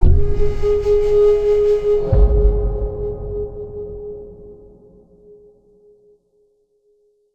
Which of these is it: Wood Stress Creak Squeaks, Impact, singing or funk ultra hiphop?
Impact